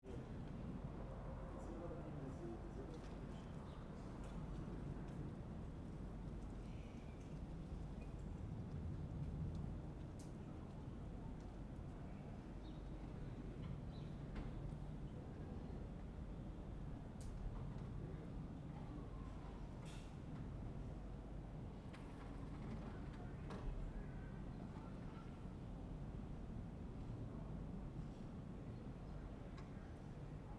Hebrew Jewish singing voices; atmosphere

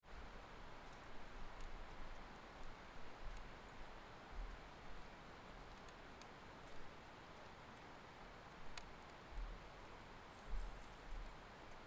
Rain out of window thunder; atmosphere

